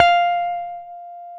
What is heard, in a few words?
F 4 JAZZ GTR; Univers Sons; Jazzistic